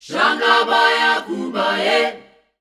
Shanga Baya Koo Baye Eb 100, Voices Of Africa Sung Phrases